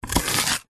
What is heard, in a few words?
food capsicum pepper chop with knife